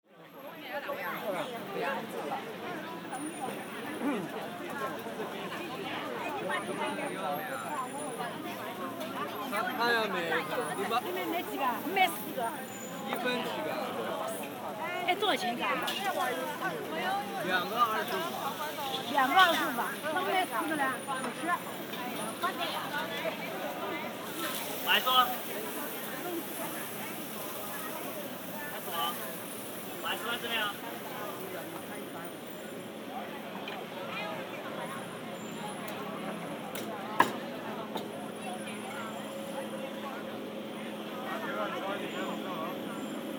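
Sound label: taipei ningxia yeshi, lively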